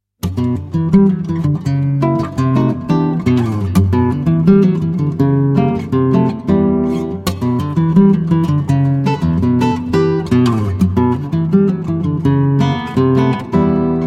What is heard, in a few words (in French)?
Basse bossa, guitare